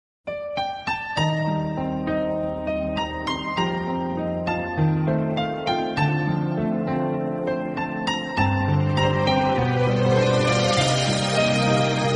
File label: beat